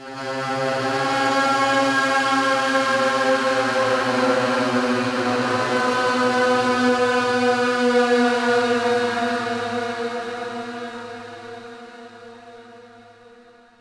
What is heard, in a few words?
ice; DAoC